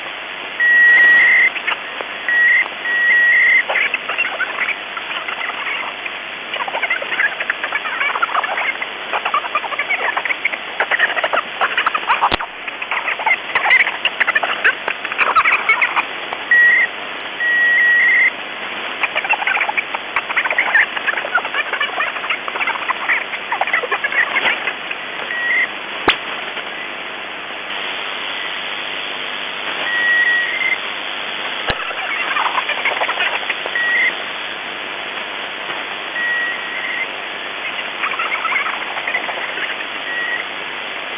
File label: 7692 kHz voice scrambler